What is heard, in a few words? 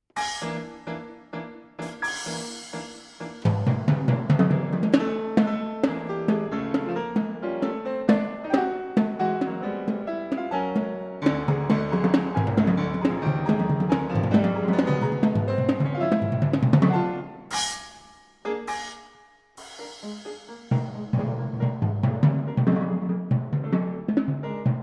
sonata for percussion and piano